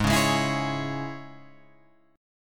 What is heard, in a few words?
G sharp Suspended 4th Sharp 5th G sharp, Guitar Open F; G#sus4#5; strummed; JGuitar